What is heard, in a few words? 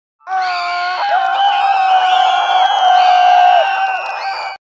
everyone screaming; chikn nuggit